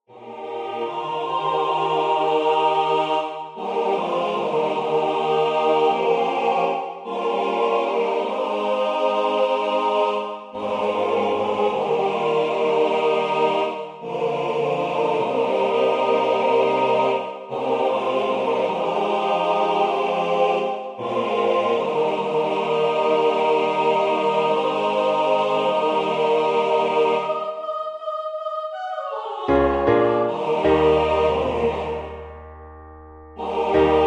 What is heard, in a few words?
MIDI SATB